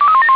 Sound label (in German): tonrufkombination